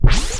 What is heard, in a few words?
SCRATCHES; Scratch 1